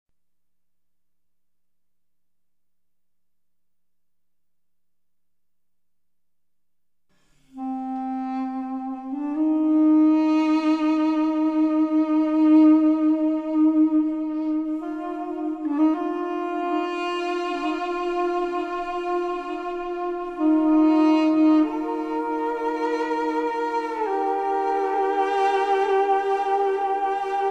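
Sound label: melody; Myst III Exile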